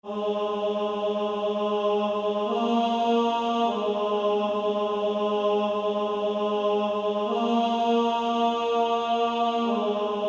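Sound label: Female Choir, alto 2; Only a step to Jesus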